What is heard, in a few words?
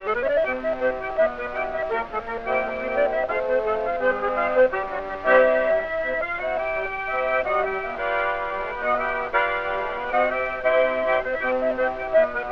saxophone with orchestra; variety stage